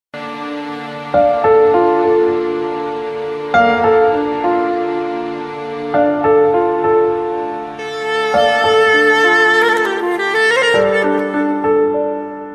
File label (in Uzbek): Klarnet Fon Muzigi; Ishq Sohillari; Turk Serial